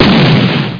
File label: explosion